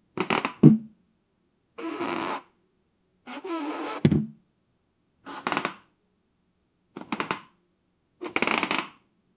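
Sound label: subtle shifting on a toilet; bathroom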